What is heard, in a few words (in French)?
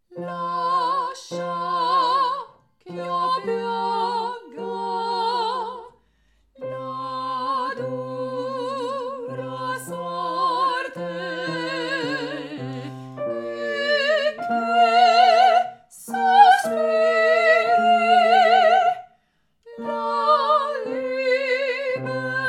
Ténor (avec basse); Lascia ch'io pianga; pupitre des tenors